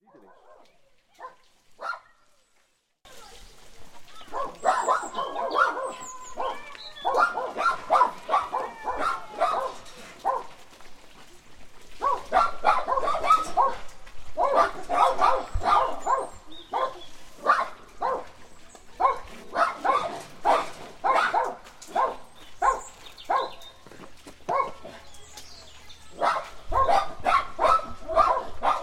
Latido de Cães; Canelas

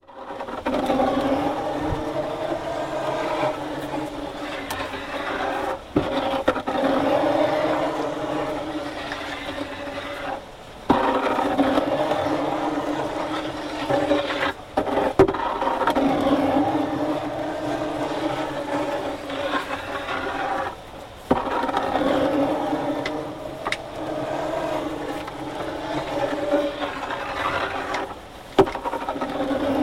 Moinho de Água; Rompecilha

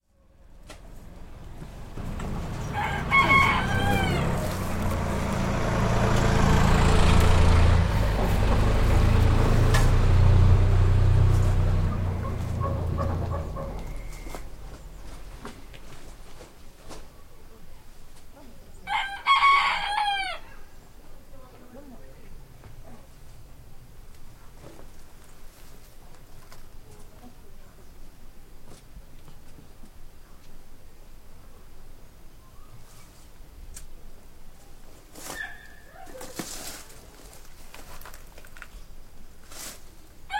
Trator garnizés e passos; Leirados

